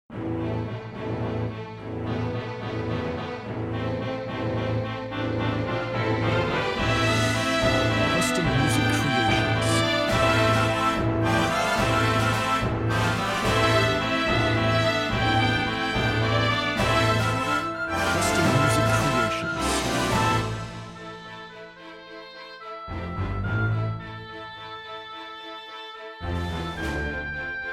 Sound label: Symphonic Soundscapes